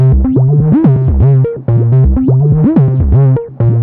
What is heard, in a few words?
Rollerboy, minimal electro